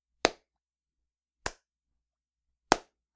clap; strange echo tin sound when recording voice over

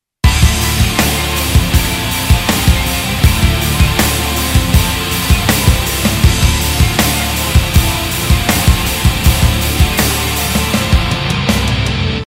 modern rock mix; modern heavy rock style

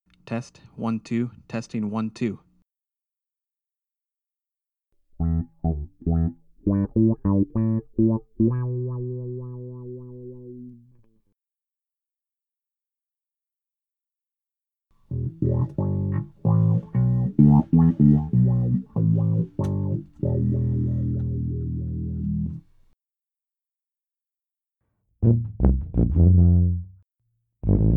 cry test, horns through Wah Pedals

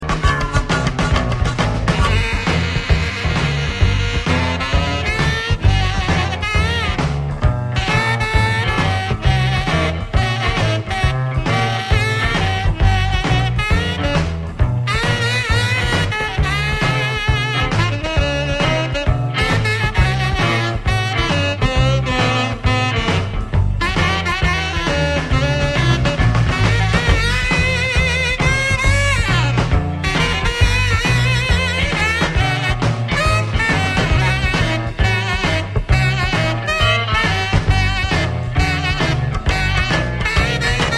sax clip; saxophone

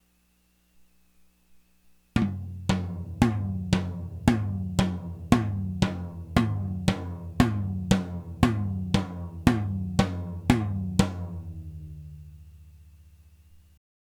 dry tom sample; contact mics

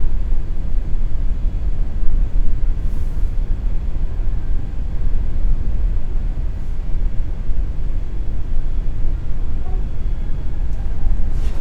noise rode; rode nt1a buzz windlike noise

